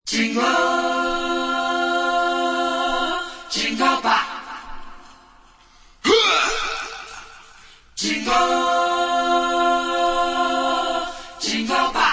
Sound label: (original acappella version)